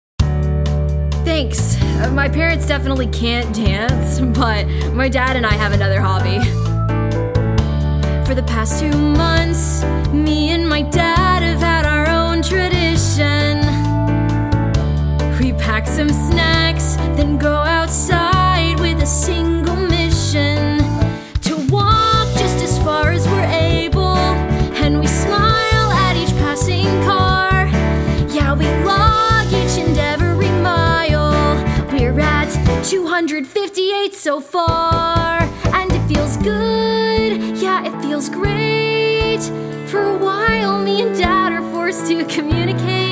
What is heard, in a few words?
VOCAL